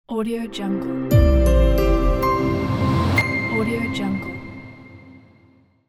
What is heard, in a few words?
calm logo